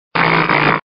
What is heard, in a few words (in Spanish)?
Grito de Dusclops